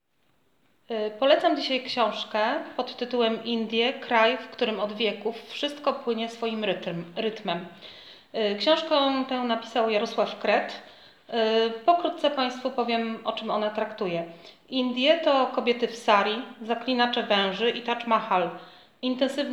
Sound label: indie